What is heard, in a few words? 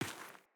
Sandals Run Rock, Footsteps; Player